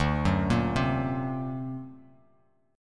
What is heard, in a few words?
D Augmented 7th Bass Standard, chord; D+7 arpeggiated; JGuitar